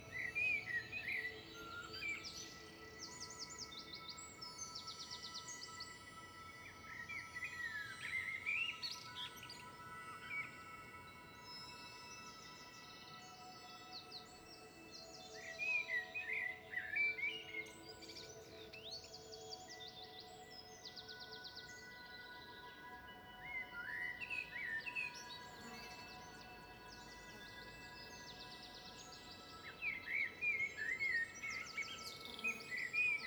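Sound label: Garden ambience loop; My Little Pony World; android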